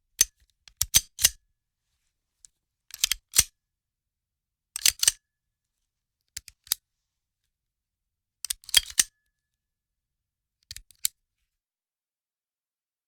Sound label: handgun handling souds